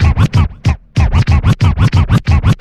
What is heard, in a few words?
Vinyl, Percussion